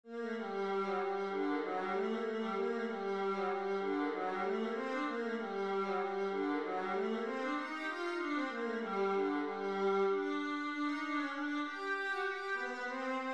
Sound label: nahawand vla